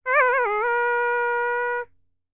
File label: BULGARIAN, World Voices, BALKAN